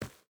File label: footstep barefoot dirt run; Rust